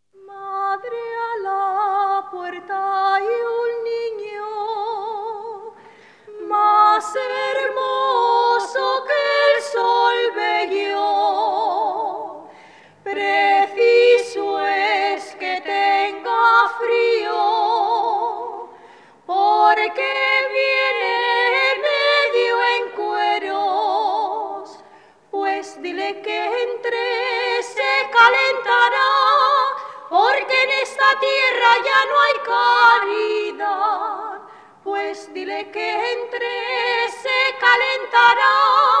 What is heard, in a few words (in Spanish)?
villancico